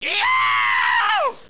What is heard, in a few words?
SCREAM